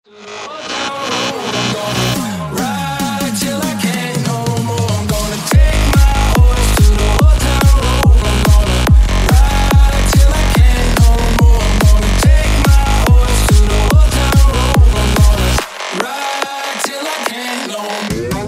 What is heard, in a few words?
groove remix